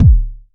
bass drum single shot d key, kick single hit tuned to the D note